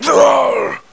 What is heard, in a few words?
pain zombie